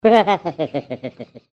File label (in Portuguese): risada